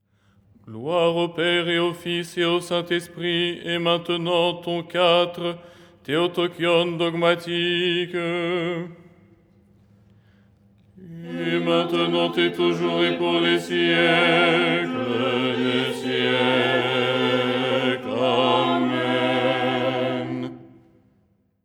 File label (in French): basse; " Gloire… et maintenant… "; Les 8 tons en français; ton 4